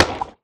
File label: axe mining; Factorio